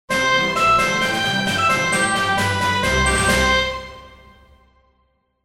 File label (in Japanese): アイキャッチ オーケストラ; 商用利用可能な無料のアイキャッチの楽曲です